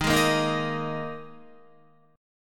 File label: Eb Suspended 4th, Guitar; Ebsus4 strummed; JGuitar